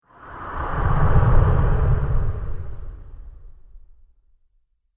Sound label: Big Alien Bugger; Alien Sound Effects